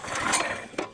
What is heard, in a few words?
generator start fail